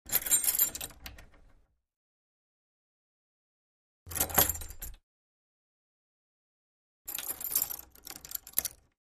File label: Keys Shake On A Door